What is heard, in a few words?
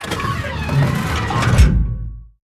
gun reload; World of Tanks